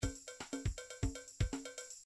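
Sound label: midi drums drums latin funk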